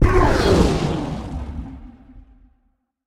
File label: activate start; smite; god cthulhu